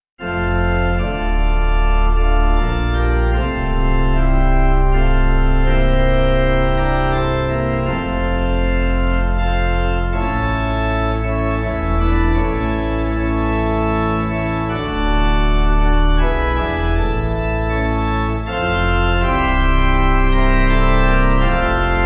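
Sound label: Pipe, Pipe Organ; Brighton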